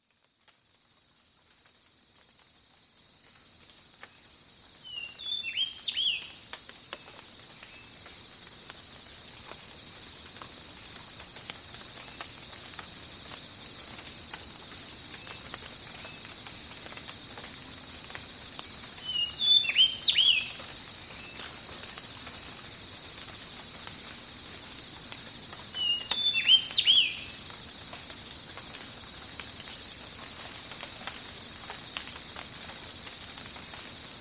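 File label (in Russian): дождь в тропиках объемный 3d звук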